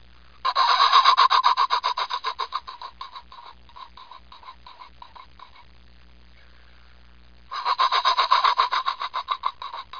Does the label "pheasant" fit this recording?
Yes